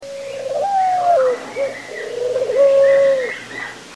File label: Doves